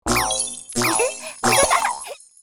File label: xmas jump snowman